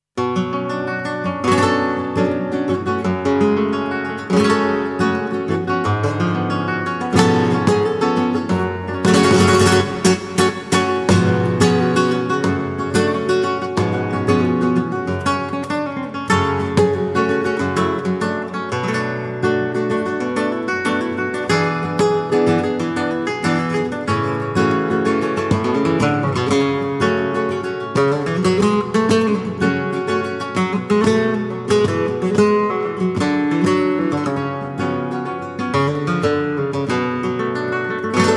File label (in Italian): Rumba, chitarra classica; Brano di Flamenco